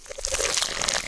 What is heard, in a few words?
gun fidget shake, weapons; hgun